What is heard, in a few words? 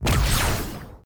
Monster; Zenless Zone Zero